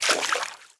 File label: fantasy Footstep Water; little robot sound factory